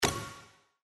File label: Bonus Super Wheel Stop; slots; Outdoor America